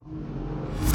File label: Battle